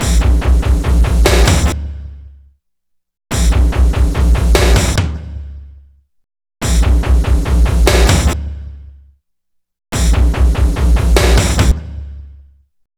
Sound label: 145 right drums; maudio